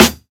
Crispy Snare Drum D Key; snare drum samples

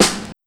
00s piercing steel snare drum g sharp key; Duration 0:01

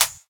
natural hi hat g sharp

